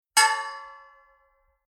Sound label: Industrial Metal Hit Drum Single Gas Canister Hollow Impact Ring Out